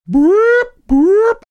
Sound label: making alarm sounds